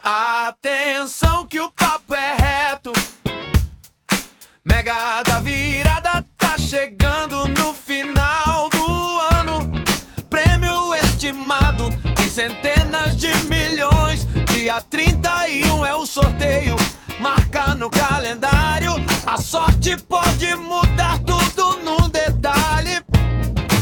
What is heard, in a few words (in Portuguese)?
Funk; Mega da Virada